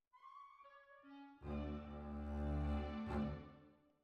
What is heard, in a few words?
Cbasses